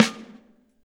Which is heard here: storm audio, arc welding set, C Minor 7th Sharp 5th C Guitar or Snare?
Snare